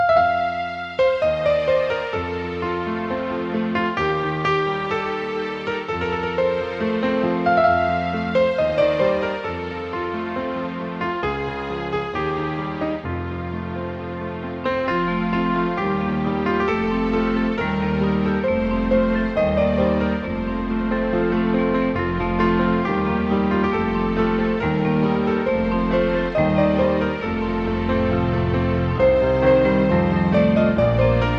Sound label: Piano Drops